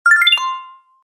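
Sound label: sms sound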